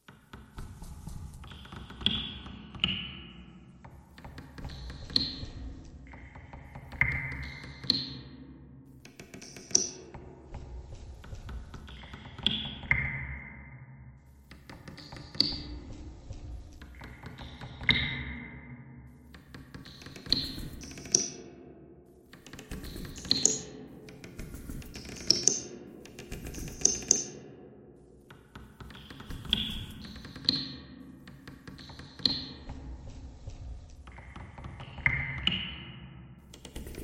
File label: bagpipe thread noise; reverb